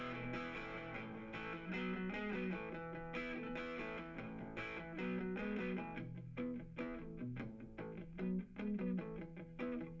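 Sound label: with drums live rec 5cm; SASSEC07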